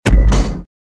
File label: hitech start, hitech door; movers; doors; Soldier Of Fortune II Double Helix; XBOX